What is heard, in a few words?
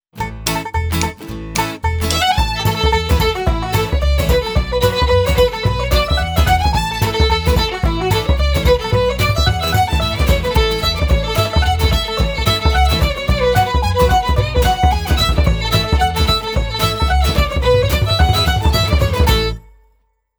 Jumps Solo A